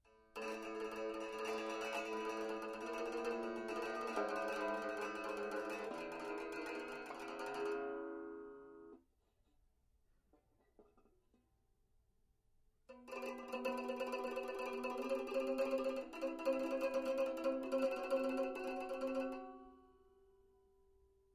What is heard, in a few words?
chop stick, banjo